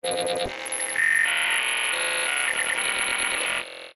glitch digital; sound design

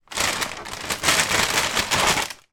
plastic cereal packet put back in box

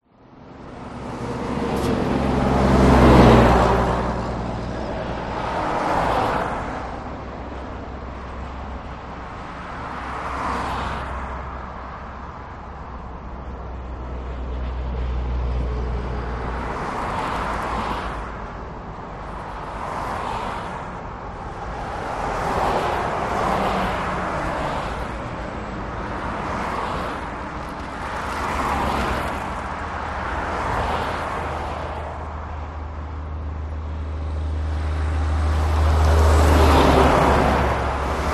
traffic motorway cars trucks

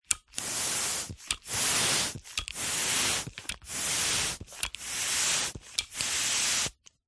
sport football pump hand pumping nozzle end; zapsplat

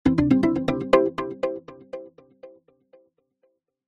multimedia alert synth muted end complete; zapsplat

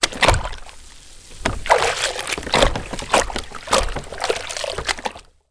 household mop movements in bucket water; zapsplat

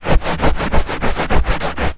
household carpet scrub clean rag; zapsplat

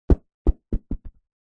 soccer ball drop bounce carpet; zapsplat

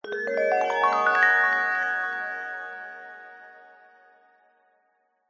magic glissando ascending bright warm twinkle; fantasy; zapsplat

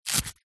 foley wallet velcro rip coin movement; zapsplat

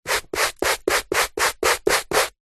brush hand held sweep carpet fast multiple; household; zapsplat

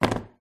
phone drops on wooden table; your free sounds

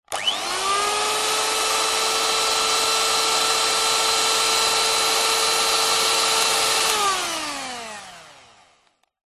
household electric whisk on run off; zapsplat

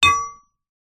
impact metal rod

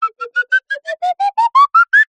cartoon slide whistle ascend stutter slow

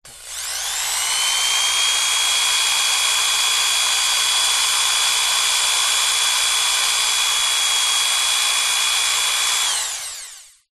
Hand Drill

